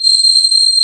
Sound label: CMI ORGAN; Wizoo - Powered DX